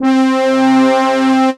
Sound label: Baby Horns; Ableton; Factory Packs; Retro Synths; P-50 Linear; P-50 Structures; P-50 Partials